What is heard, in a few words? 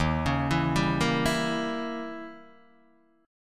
D sharp 7th Flat 9th, D sharp, down, Guitar, Open D